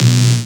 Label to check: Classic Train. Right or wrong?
Wrong